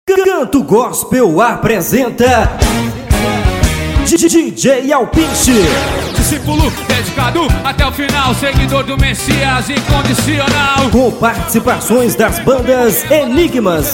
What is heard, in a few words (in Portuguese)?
vinheta canto gospel